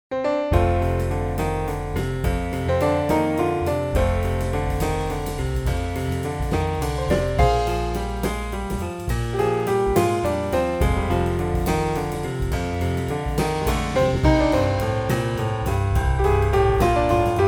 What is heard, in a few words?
blues